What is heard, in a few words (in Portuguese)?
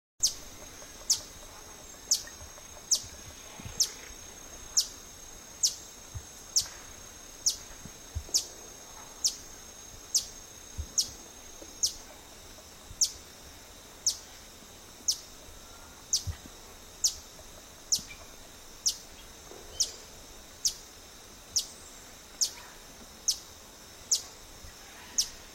Canto do beija flor estrela